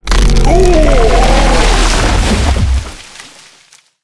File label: Death; Death Blossom Whomper